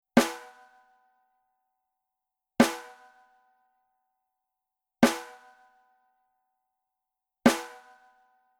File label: SNARE Center Hard